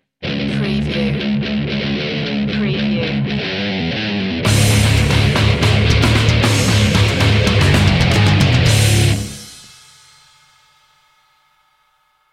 disturbed skater sting

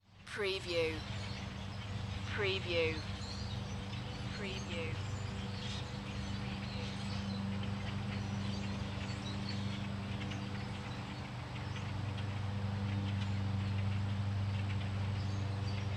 Suburban Day Lawn Mower; sound effects bible

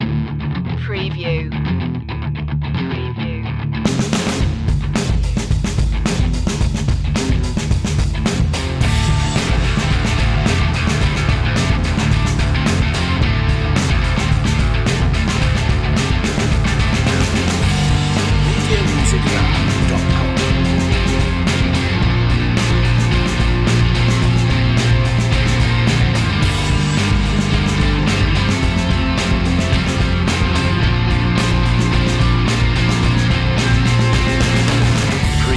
heavy rock

